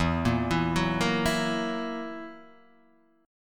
E Suspended 2nd Flat 5th E down Guitar Half Step Down